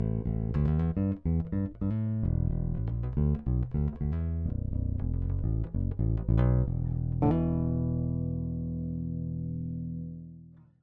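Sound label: Big Single Passive Neck, 5-String Pickup; Fralin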